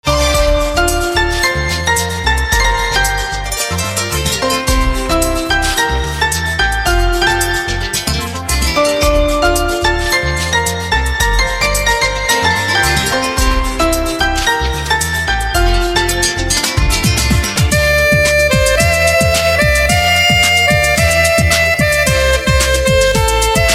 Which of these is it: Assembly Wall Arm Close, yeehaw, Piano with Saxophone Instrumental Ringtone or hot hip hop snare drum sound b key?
Piano with Saxophone Instrumental Ringtone